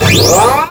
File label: All Coolant